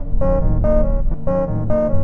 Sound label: 117 honk honk; melodic loops; synth and electronic loops; you are it